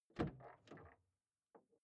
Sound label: car door open exterior hummer h3